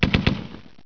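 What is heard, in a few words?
distorted machine gun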